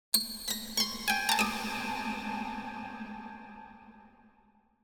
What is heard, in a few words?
Harmonic Plucks Harmonic Pad Mystic Harmonic Plucks Dissonant Arpeggio; Hollywood Edge; sound designer's toolkit v2